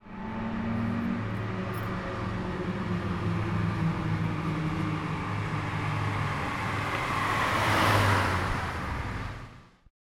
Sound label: Sound Road No Effect